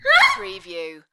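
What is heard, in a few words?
Woman High Laugh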